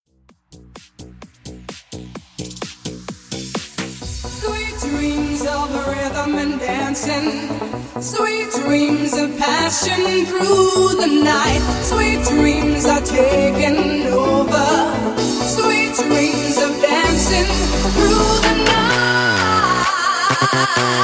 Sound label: Bass House; Remixfreakz